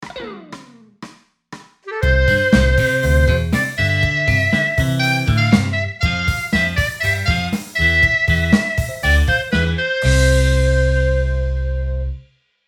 Celebrative moment upbeat band sitcom

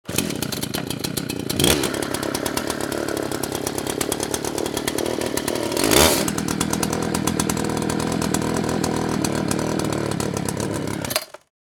Chainsaw starting running revving and turning off

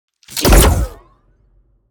Sci fi heavy gun single blast

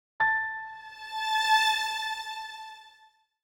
Mobile game piano strings alert notification

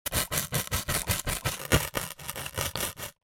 Cardboard box cut slice with knife

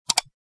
Plastic computer mouse single click

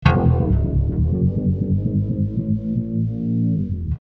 Electric guitar bass drone effect

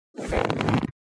Leather baseball movement creak

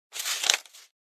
Banknotes count out into hand

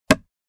Wood panel impact

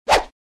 Fast swish whip bamboo stick

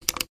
Apple magic mouse click

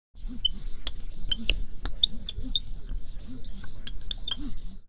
Large marker pen colour on whiteboard

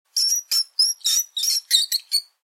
Mouse squeaking and communicating

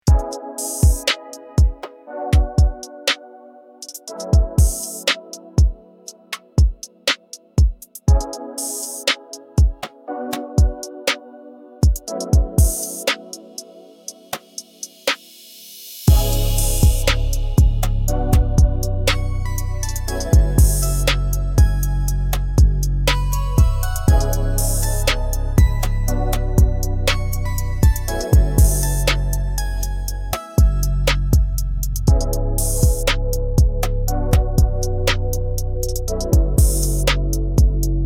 Chill beat

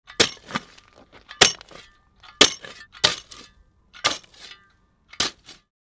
Shoveling dirt prepare the garden bed

